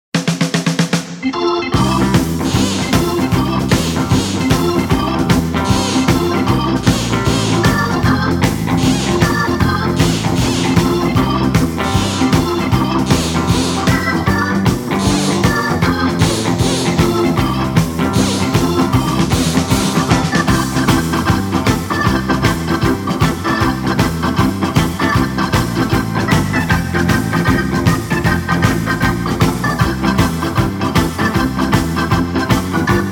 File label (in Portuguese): teclado e orquestra